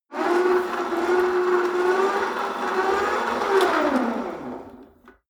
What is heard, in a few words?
rope trainer pull sequence medium, fitnessstudio